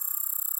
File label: type